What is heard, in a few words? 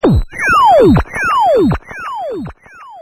Laser Blast Repeating